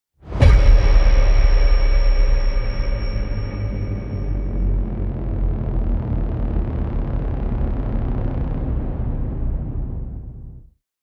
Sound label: boom signal